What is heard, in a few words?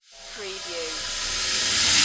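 Production Element Reverse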